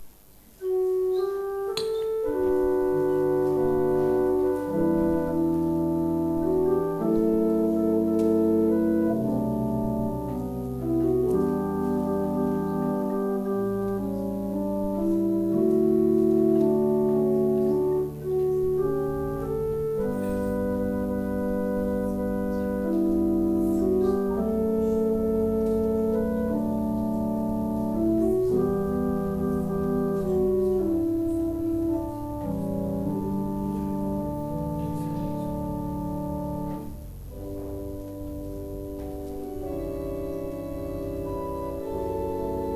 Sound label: organ interlude; played during the offering